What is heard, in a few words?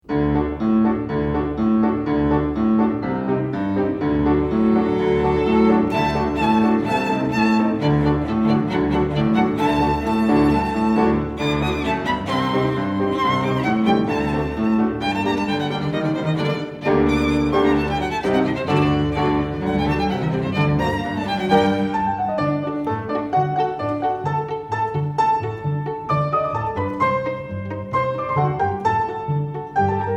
Fast Klezmer Dance